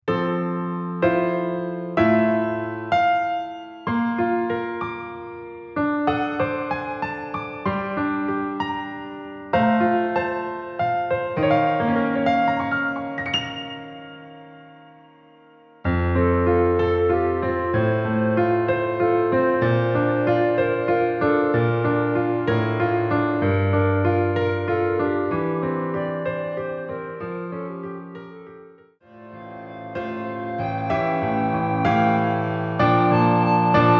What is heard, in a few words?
piano